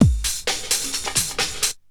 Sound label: Bite Size Beats; FM 57 - The Ultimate Sample Collection (1997); Essential beats